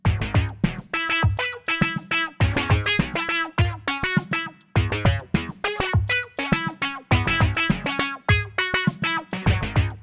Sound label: funk-o-matic ghetto kit